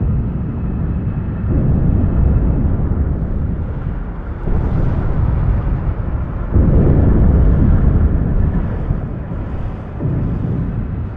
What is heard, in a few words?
space battle ambient; Quake 4 Alpha; id software; vehicles; dropship